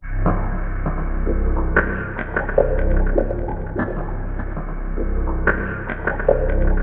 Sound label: C1, SynthLine; 90 s Sample CDs; NiceBeats; SynthLine VOL-5